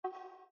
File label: Windows Navigation Start